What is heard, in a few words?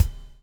BUBBLE; Spectrasonics - Backbeat